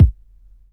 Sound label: hot kick one shot e key; tuned drums e key